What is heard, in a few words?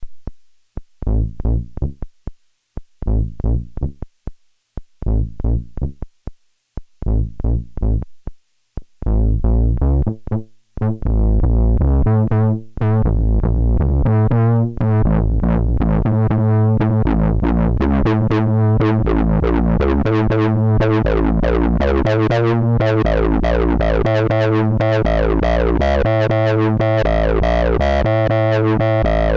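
Dancy Angry Bass 120 bpm A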